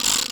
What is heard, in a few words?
ratchet